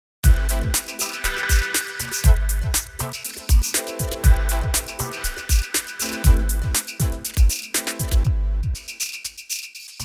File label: Rhythmology Loop